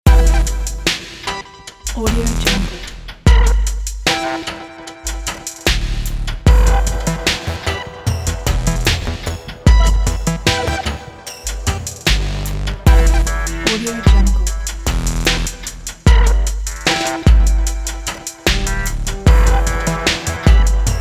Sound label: future industry